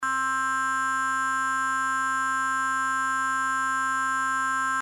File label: 4bit Major chord